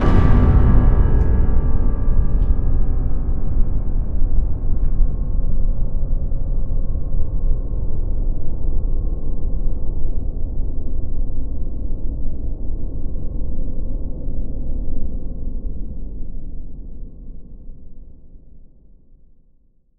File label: Piano Hit; BlueFX; Bonus - FX & Transitions